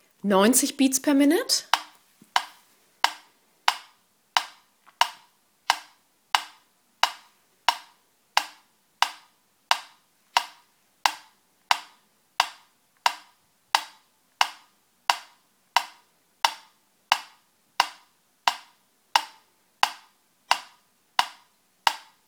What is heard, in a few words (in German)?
Metronom 40 BpM